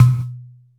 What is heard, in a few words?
bottle, Percussion Ticks; Misc Percussion